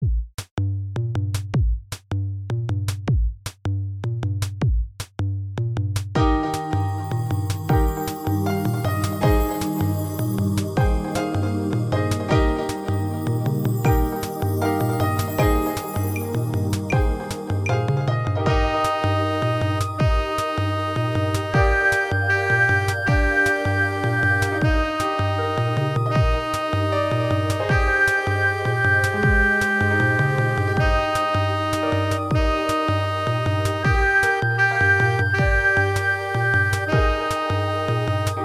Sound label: Two Note Chill E Flat